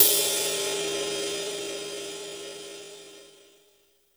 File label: Cym; Roland R-70